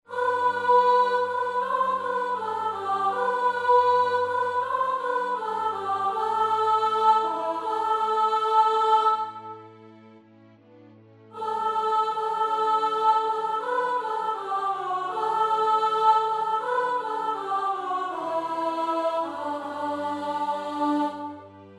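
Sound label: Soprano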